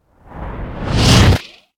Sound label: Impact Whoosh Strong Air Whoosh; Hollywood Edge; sound designer's toolkit v2